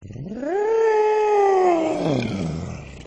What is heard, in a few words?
Big Dinosaur, Dino Cave